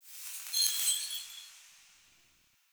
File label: Mote Chatter; UI; Motes